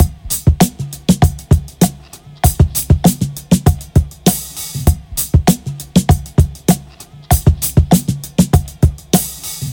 99 bpm drum loop sample e key, Free drum beat - kick tuned to the E note. Loudest frequency: 1537Hz